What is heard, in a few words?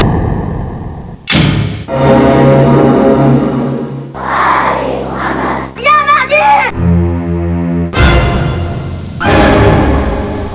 music